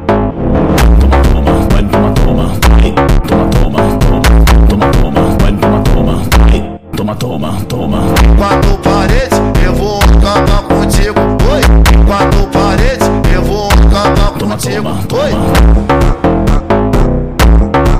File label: Brazilian Phonk Ringtone